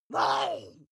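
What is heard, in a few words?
witch groan short, creatures; kreaturen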